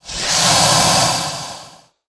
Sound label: worm striking poison